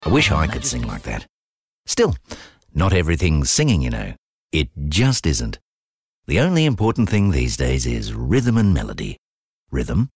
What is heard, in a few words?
singing; EM Voices